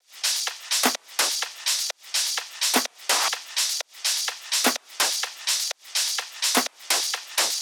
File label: 126BPM Drums, TechHouse; Offshore; Vengeance Samples; Bonus TechHouse Kits